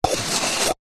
household cup plastic empty slide upside down on table magic trick; zapsplat